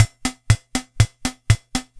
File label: Yamaha PSR-22 Country